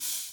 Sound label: Percs